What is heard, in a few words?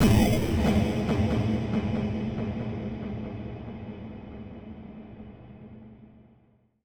TECHNO FX 140